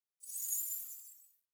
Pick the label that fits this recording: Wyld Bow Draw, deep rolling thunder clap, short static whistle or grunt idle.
Wyld Bow Draw